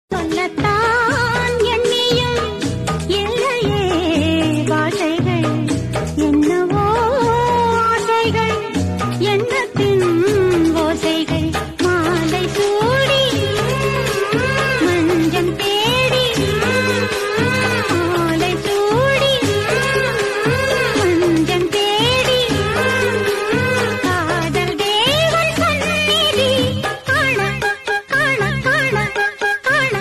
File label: mobile ringtone